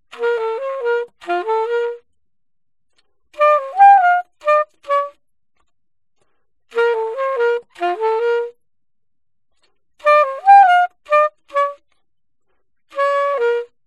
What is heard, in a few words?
altosax